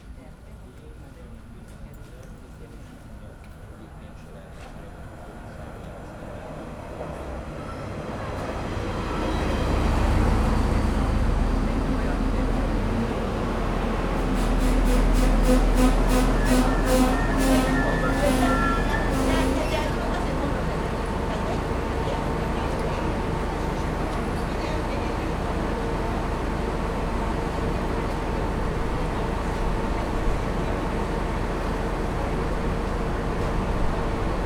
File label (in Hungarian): ujpest megallohely, vonat allomasrol kiindulo